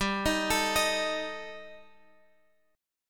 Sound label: G Suspended 2nd Sharp 5th, Guitar Open G